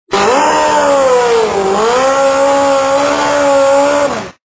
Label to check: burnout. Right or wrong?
Right